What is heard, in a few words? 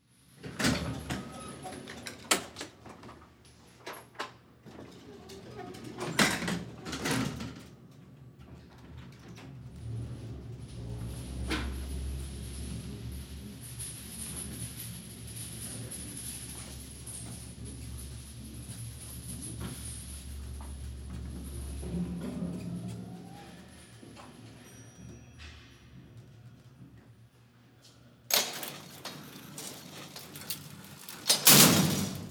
elevator old style